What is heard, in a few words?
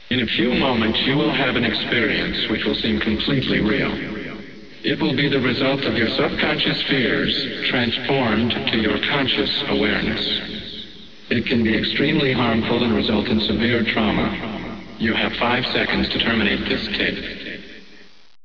Music; 特酷声音素材库; 片头音乐